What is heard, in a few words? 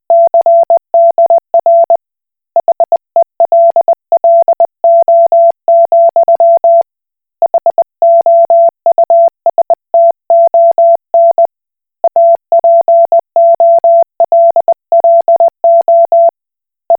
Koch Morse